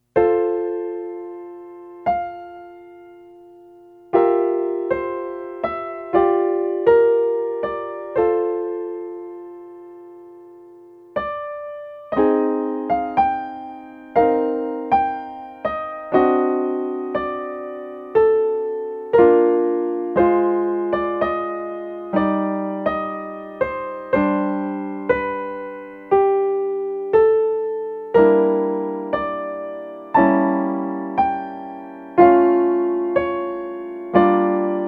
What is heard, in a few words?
Piano Concerto